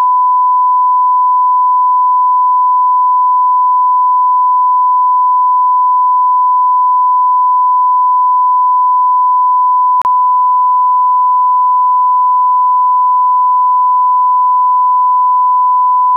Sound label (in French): alt 1000 Hz niveau max